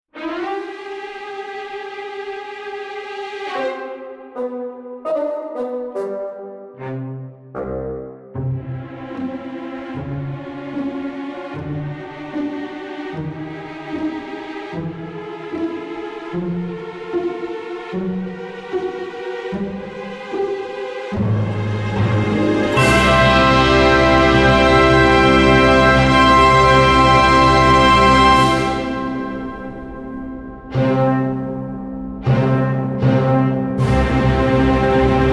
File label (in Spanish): orquestal comedia